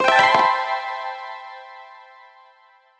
highscore

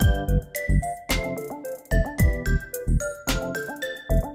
bgm

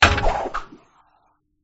sinker hit; gardenbloom

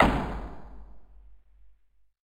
open

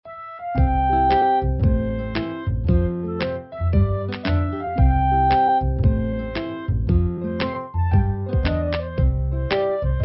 music

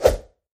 level Appear; tntbomb

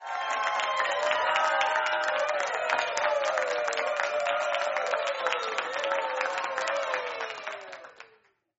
Cheer

